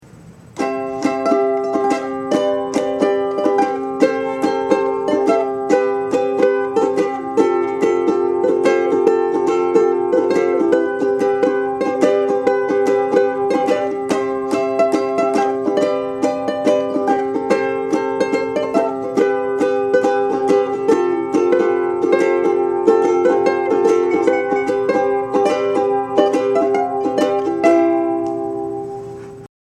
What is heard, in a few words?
uke ringtone